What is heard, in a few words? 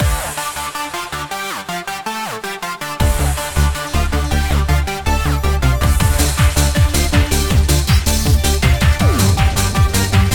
LOOP Mission; Adventure Land